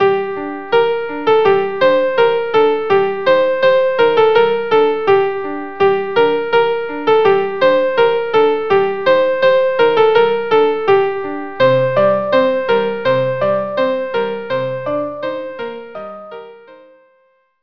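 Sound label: violin viola; Anah Halach Dodeich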